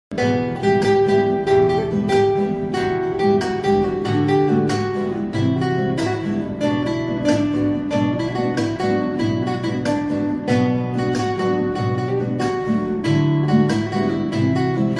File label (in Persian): Gitar